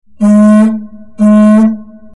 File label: Alter to Port; colregs